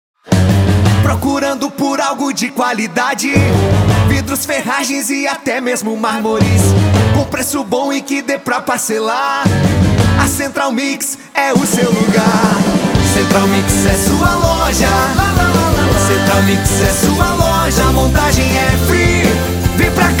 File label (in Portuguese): Jingle Parodia; Central Mix